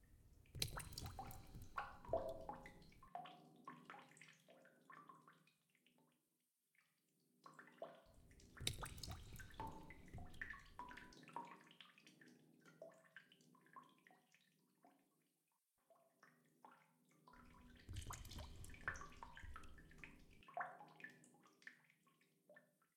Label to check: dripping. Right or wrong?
Right